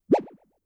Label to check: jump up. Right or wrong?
Right